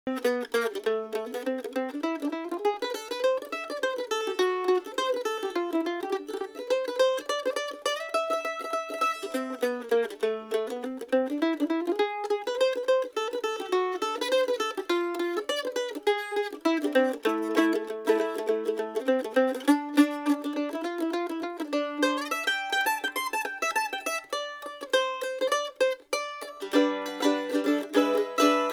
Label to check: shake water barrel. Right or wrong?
Wrong